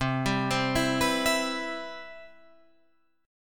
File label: C Major 7th C down